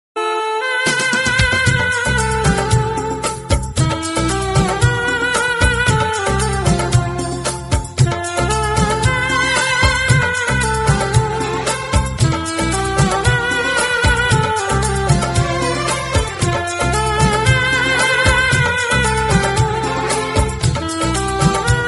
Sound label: instrumental ringtone